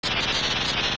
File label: Shock; Biri; zelda; OOT